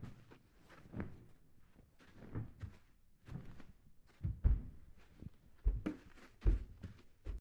Recloth; game audio; Mila; MiSide

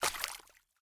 Step Water; Game rip; MiSide

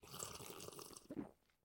Tea Drink; Game rip; MiSide

